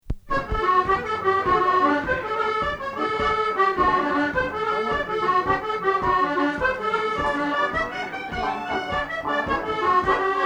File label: Jig; Rambling Pitchfork